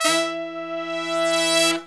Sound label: brass longs, Brass Sections, Horns